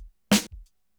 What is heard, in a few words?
SNR